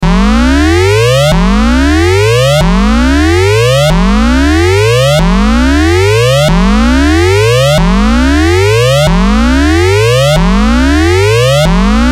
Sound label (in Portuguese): alarme futurista efeito sonoro